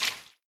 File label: water footstep